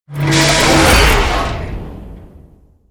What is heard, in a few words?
Iron Juggernaut Attack